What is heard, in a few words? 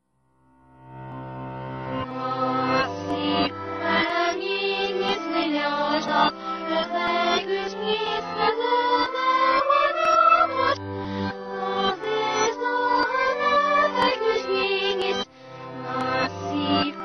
ducks in the pond quack a happy song